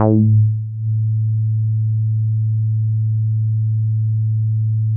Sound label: Synths BASS